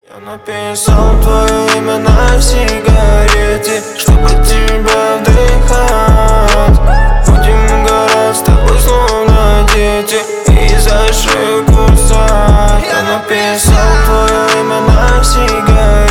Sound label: groove